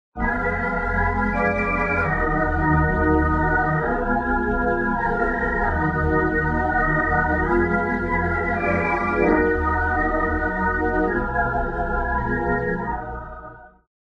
Rotary Speaker Watery Flow Organ Wet; AmpVibe; Syntheway

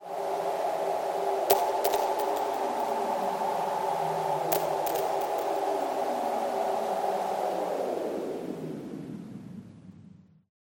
ATMO Cosmic Wind; Synthractive; syntheway

